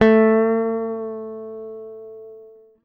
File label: GTR TRIK, Jazzistic; Univers Sons